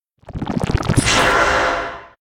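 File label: mushroom attack; monster; Senillinea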